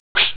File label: woosh; HoppiX